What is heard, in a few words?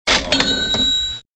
register